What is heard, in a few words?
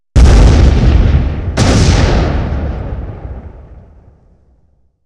Explosion, 5.1 Surround